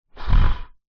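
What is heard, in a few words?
sounds of rhinoceros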